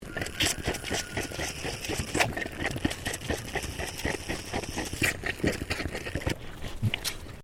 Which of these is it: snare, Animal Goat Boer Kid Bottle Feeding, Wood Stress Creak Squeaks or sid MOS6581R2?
Animal Goat Boer Kid Bottle Feeding